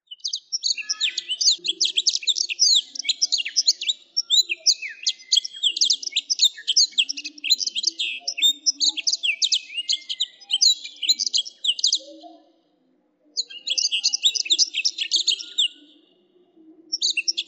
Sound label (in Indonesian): audio sanger untuk kenari isian